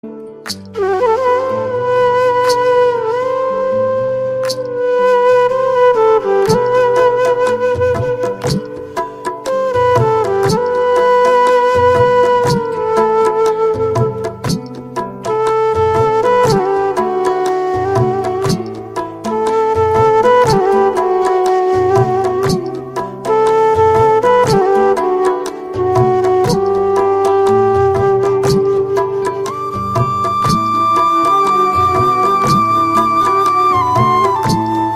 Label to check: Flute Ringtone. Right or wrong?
Right